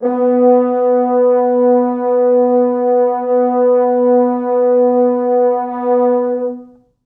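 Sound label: 4 French horns sustain B2; East West; Quantum Leap Horns Sax; 4 FrHorns SUS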